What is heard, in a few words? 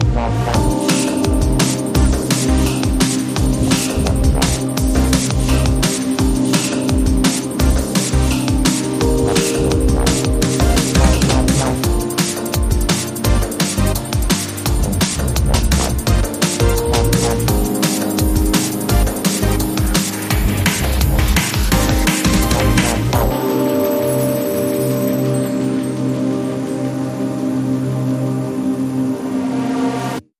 Rushing Bouzouki, Underscore